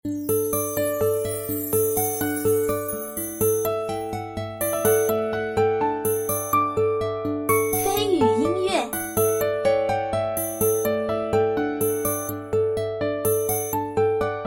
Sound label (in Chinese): 商城-轻松-希望